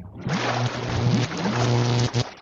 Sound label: eternal life lightning; sfx; WILLIAMS; INDIANA JONES; Indiana-Jones-Original